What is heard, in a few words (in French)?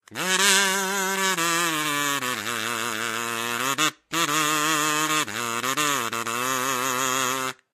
Sonnerie gurdil kazoo; donjon de naheulbeuk